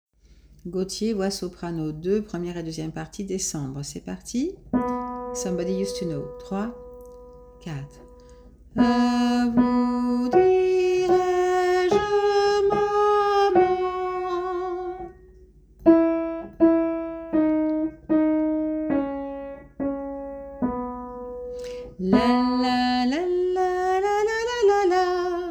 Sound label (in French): voix soprano